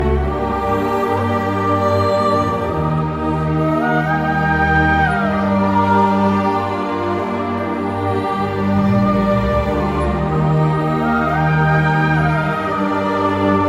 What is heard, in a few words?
Sad Ringtone